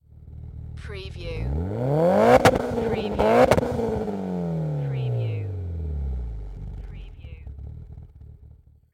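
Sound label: MITSUBISHI EVOLUTION EXHAUST DOUBLE REV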